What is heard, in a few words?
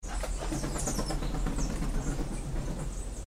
Crested Oropendola Wingbeats